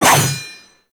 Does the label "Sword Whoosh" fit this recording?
Yes